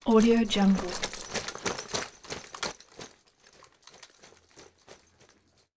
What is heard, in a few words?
gear movement soldier; cartoon; دویدن سرباز با تجهیزات